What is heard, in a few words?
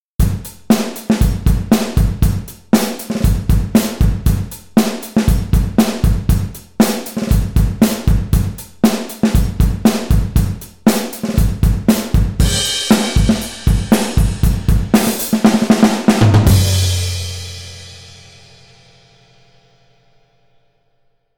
Drums; 70’s style rock riff